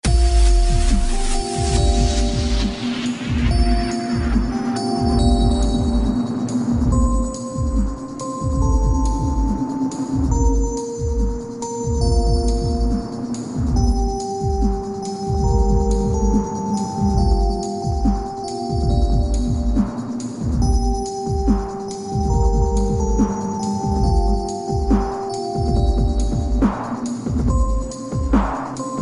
Xmas Silent Night

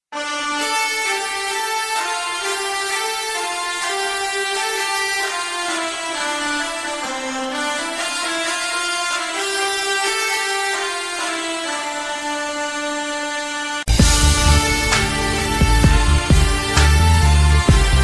Instrument

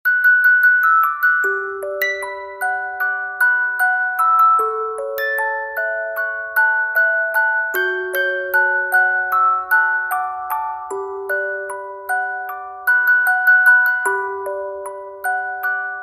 Music Box

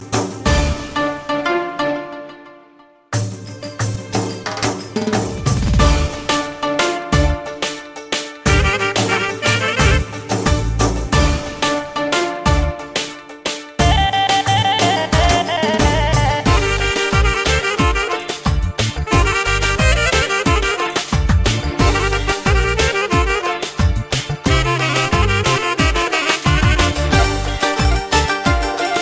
Shqip Ringetone

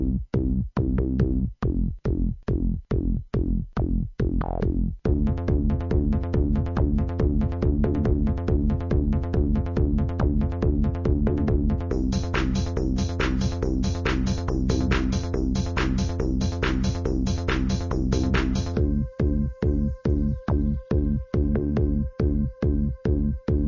Techno

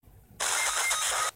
Earrape Death; Fortnite

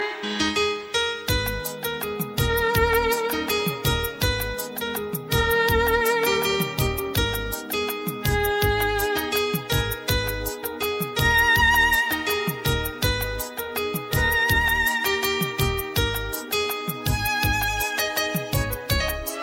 Romantic Valentine

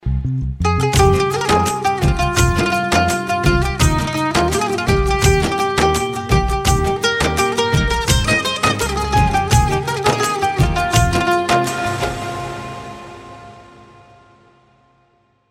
Sweet Guitar

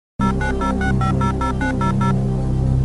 Dark Tones; X6

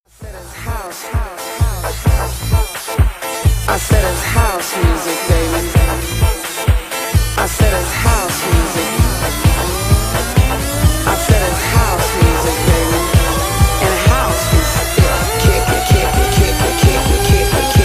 House Music

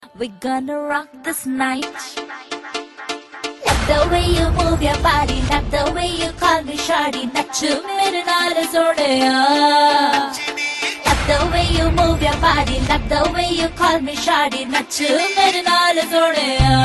Cool Punjabi Song

